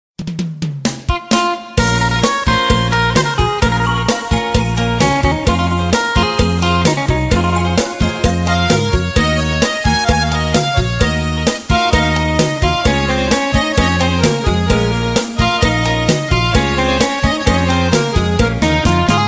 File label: Hmong Music Only